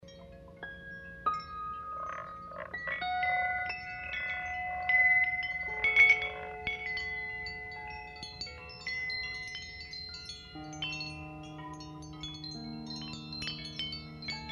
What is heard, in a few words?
Chime Time; Korneitchouk